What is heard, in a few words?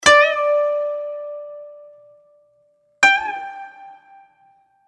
koto